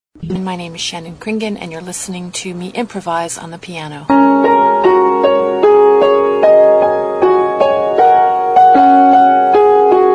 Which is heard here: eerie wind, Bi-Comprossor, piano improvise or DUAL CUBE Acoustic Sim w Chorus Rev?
piano improvise